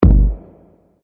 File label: Blast; Yet Another Pixel Dungeon; Android